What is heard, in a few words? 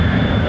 Jet Engine; Working Model Program